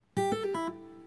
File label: Guitar mini riff